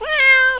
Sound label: miaow